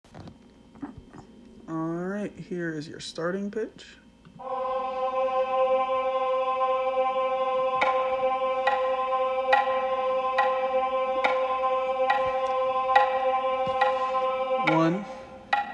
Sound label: Sop Melody; Alma Matter